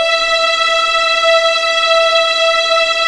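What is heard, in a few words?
E5 legato, The Ultimate String Collection; East West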